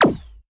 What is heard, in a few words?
laser; factorio; Factorio 0.14.21; fight